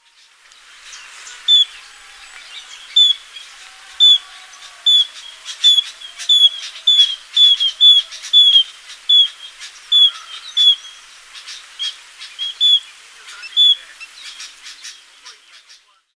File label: Chestnut Munia calls; Farrow